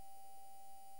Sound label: mic, mars2020 edlcam